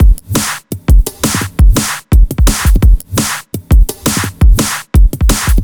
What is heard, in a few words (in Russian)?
Atom Set, сэмплы ударных drum bass